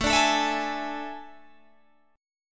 A Major 7th Suspended 4th, Guitar, Open G

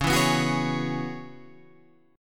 C sharp Minor 11th C sharp, Guitar Open G

